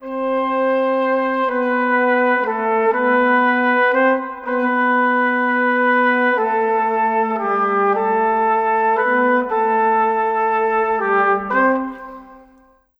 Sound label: Brass, Cinematic; Sonic Foundry (Sony Creative Software); Orchestral Series VOL-3; Cinematic 22